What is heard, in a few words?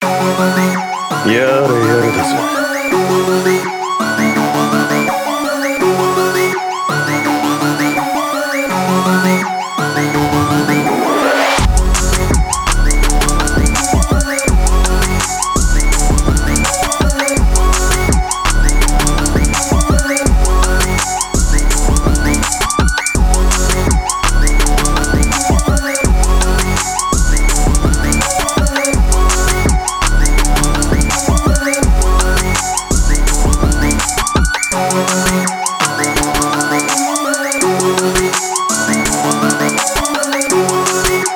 TRAP BEAT HYPERPOP